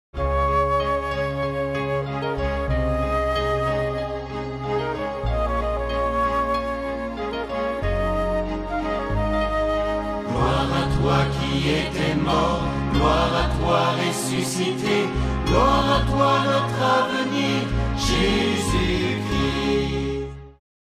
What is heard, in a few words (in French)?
Anamnèse, Ensemble vocal; Dédicace